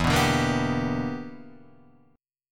E Minor 7th Sharp 11th E; strummed

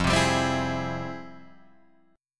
F Minor Major 9th, Guitar Open D, chord

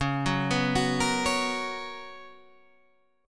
B Major 7th Suspended 2nd, chord

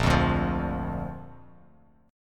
Bb Major Bb down Bass Standard, chord; strummed; JGuitar

